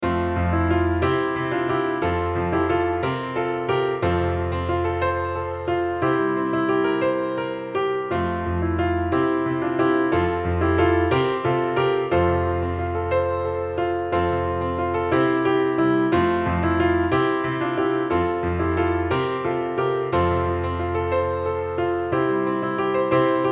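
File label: GLORIA PATRI accompaniment; partbypart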